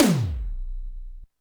Yamaha RM 50 Toms

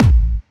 Soft, kicks

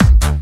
Kick n Bass

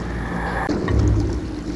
Breath Loop; Sound FX

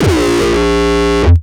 kick; shock. Ultimate Kicks vol. 2

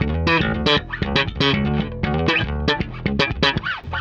Spikey Funk, Bass Drum Kicks

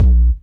kicks, Bass Drum

